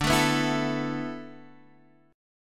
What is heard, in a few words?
E 7th Suspended 4th